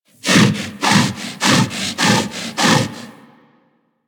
Armored Vocal Breath Run; Enemies; Kubrow; Warframe

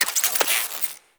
Drone Switch Out; Warframe; Deimos; Cephalon Drone

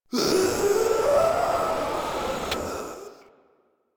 Proto Infested Runner Death; Warframe

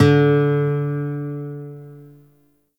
Shawzin Oct One D; Warframe; Lotus Shawzin

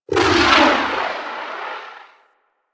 Artillery Barrage Explosion Far; Weapons; Powers; Warframe; Archwing

